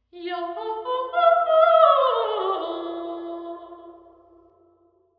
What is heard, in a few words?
tunnel entrance sing, innocent railway tunnel